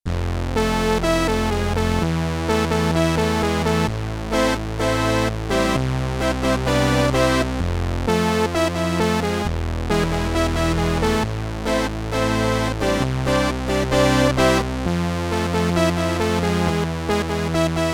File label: Double Octave Brass, Prophet 5; Yamaha TX16W